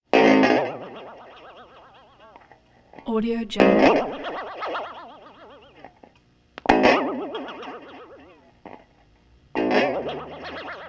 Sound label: boings wavering spring tones